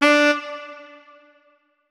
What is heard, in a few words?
notes, saxophone; Hideout Furniture